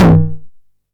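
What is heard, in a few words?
909 mid tom tape saturation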